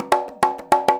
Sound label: FILL, Percussion